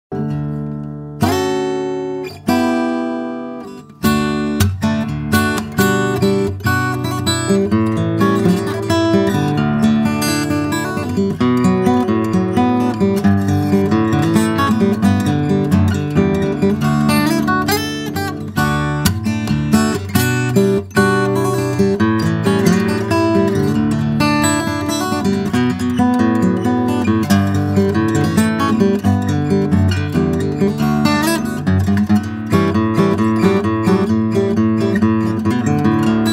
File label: Guitar sample; Group 5 (Vintage vs Vintage style contemporary)